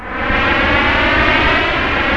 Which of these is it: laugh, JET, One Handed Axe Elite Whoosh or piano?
JET